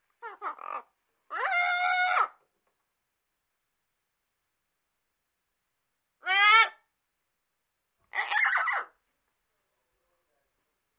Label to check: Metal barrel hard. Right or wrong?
Wrong